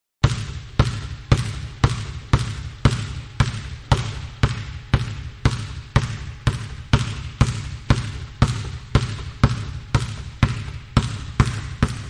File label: Basketball Indoors-Dribbling-28 Times At Medium, Then Fast Speed.; Basketball Sound Effects; Manhattan Production Music